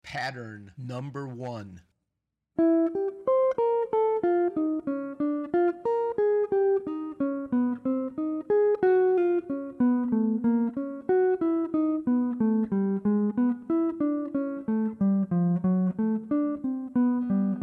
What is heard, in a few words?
Cycle Of 4ths Dom 7th Exercises; lead skills materials